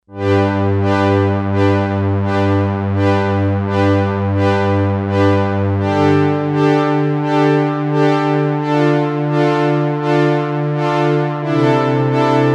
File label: Strings, Sequential Circuits Six-Trak; Factory Programs